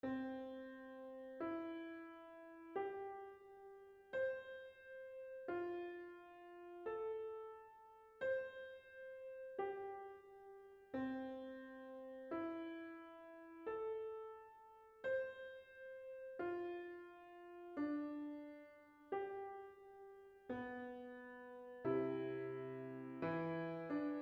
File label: Keyboards; Sample Reflections